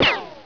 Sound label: ricochet